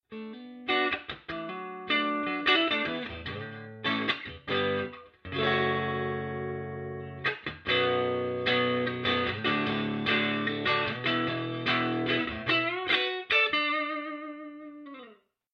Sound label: clean